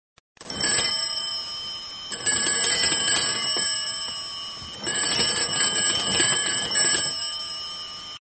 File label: Crank Fon Ringing